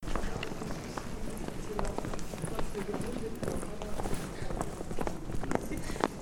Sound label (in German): Auf Pflastersteinen laufen